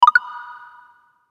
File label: notification